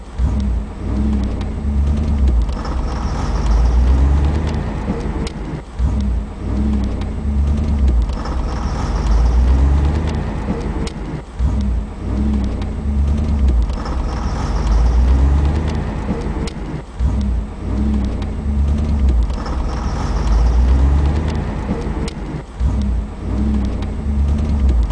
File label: accel knock